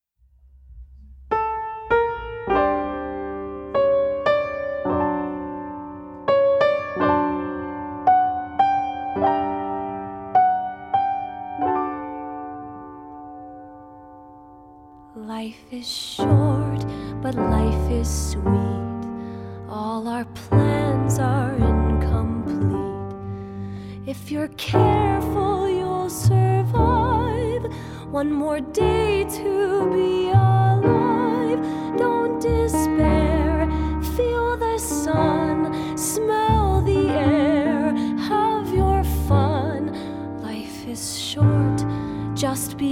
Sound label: Life is Short with Vocals